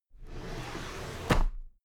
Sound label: drawer cabinet paper open regular